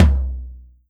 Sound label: lo Tom; MicroDexed; Drums